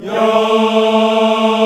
VOX African Yas; African Aahs; Roland LCDP11 Africa VOL-1

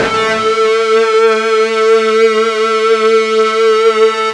FUZZ A 2 R, Guitar; East West; Steve Stevens Guitar Samples Collection

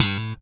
POP HMR G3, slap bass

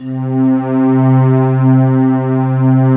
ARCHAICOL, Ambient Atmospheres

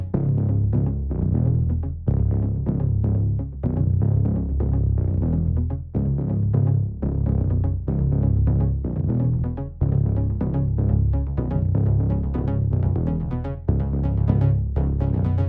124BPM COMBO BASS C#, Bass Loops

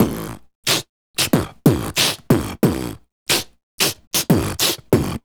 Beat Box, Hip-Hop and RnB Vocals

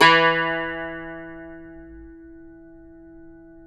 CUMBUS E, Guitars; Spectrasonics; Hans Zimmer Guitars VOL-2

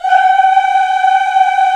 VOX, African Aahs, African Las

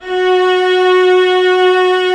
Vla F#3; Digital Sound Factory Virtuoso

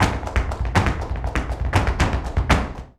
BULERIAS TAP, Flamenco

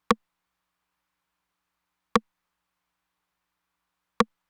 rim both, Roland TR-909; Roland TR-909 Ableton Project

